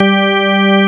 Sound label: organ